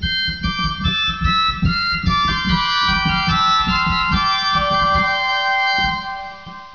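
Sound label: Mixtur 4f, organ; Martin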